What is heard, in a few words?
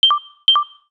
Battery low; Samsung GT-C3200 Monte Bar